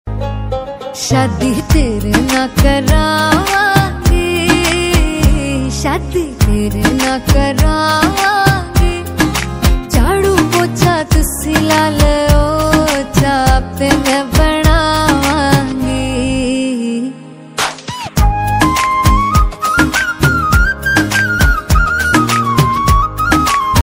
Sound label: wedding song